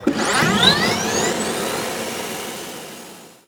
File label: spool up; snds jack gmod